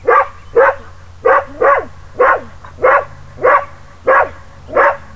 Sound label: dog bark; de scheiterhaufen2